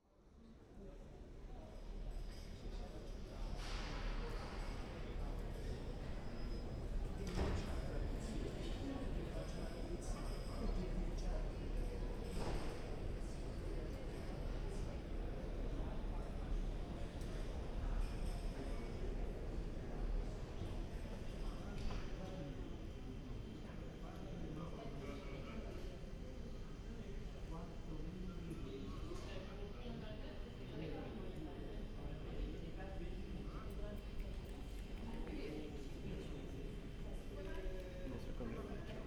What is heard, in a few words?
Urban Large Building Stereo Binaural